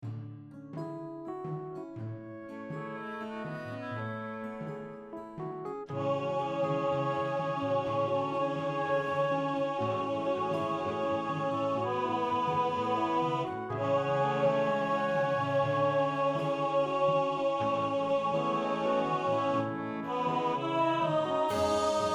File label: Tenor, rehearsal